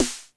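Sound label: 909 snare; Dr 202; Percussion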